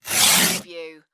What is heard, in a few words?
Paper Cutter Blade